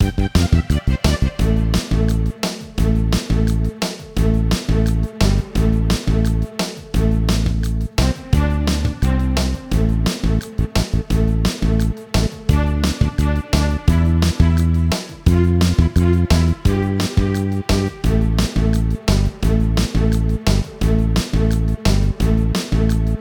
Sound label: Music Loading heroic